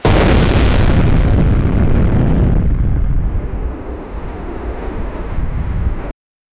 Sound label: a bomb explodes on the; explosion